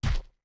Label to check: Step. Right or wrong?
Right